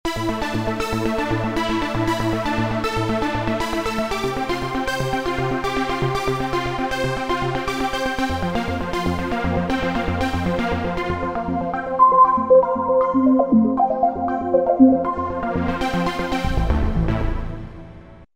Roland V-Synth; Shortcircuit; Factory Patches

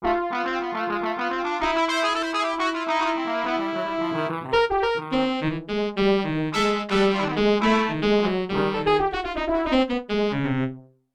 Brass Section; Yamaha TX16W; Europop Performance 1; Brass Section-Hornet

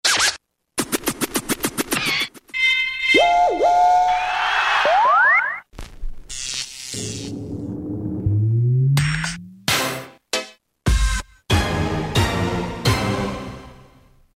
Scratch; Roland M-DC1

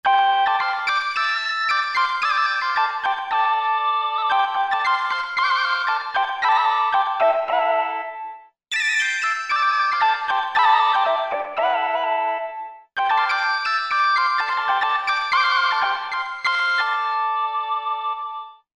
China Lead, Korg MSC-11S Brass

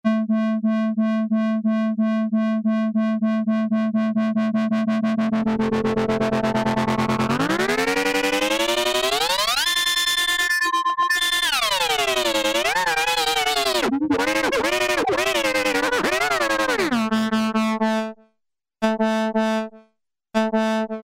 LFO Abuse, Alesis airSynth

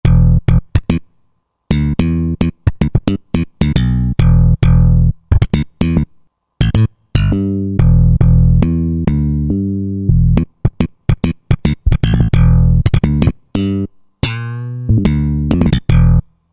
Velo-Switch Bass, Roland JD-990; Factory Patches

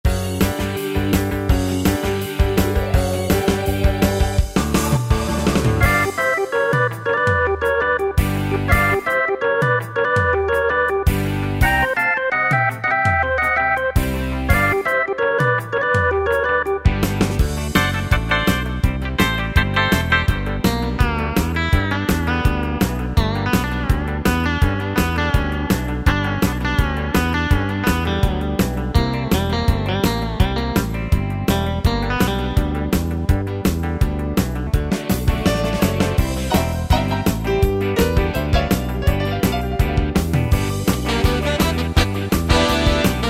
60's Rock & Roll, Yamaha PSR-3000 R & B style; Factory Styles

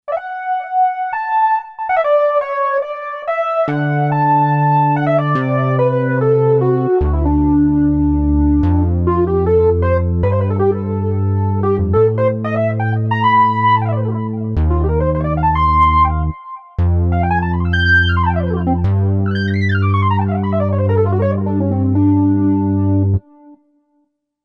Bass-Lead, Roland SC-880; Factory Patches

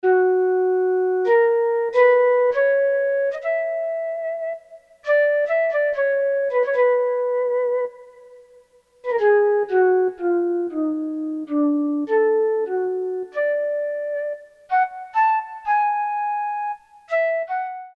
FLUTE, Korg DSS-1

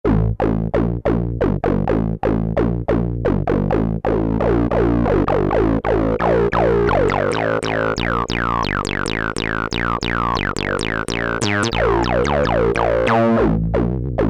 Rave 03 Sw MW, Alesis Z2 EuroDance

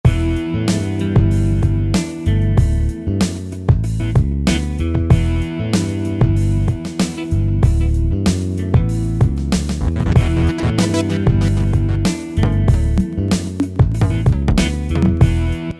Hip Hop, Yamaha DJX-IIB

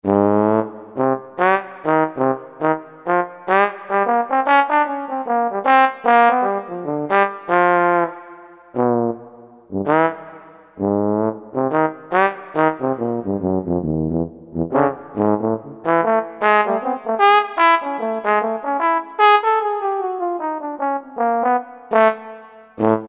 Warm Tromb, Korg N364; Factory Prog demos

